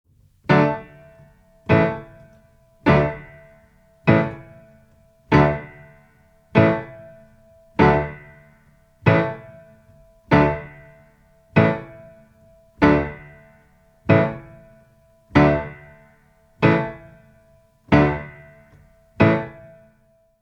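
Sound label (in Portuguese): Improvisação - Cordas RÉ e LÁ, Violino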